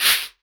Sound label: Elektron Monomachine, Drum Machines